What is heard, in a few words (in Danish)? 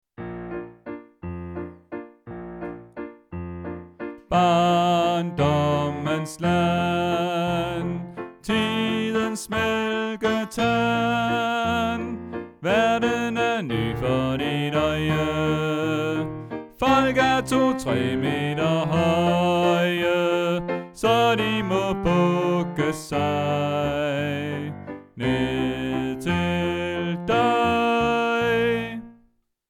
Bas